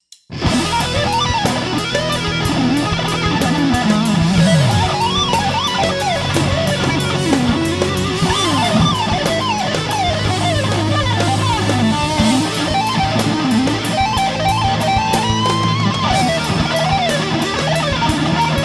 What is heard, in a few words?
Altered Chords Example, rock guitar; Shred Is Not Dead; Publisher: Alfred Music